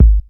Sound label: tight lows steel kick drum g sharp key, bass drum sound tuned to the G# note